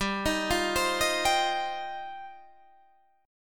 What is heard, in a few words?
G 7th Suspended 4th Sharp 5th G, Guitar Half Step Down